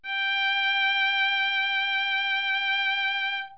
violin G5

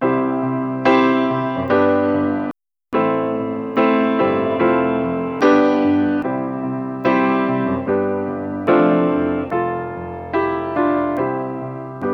beat rotate

